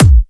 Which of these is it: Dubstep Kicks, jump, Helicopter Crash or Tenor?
Dubstep Kicks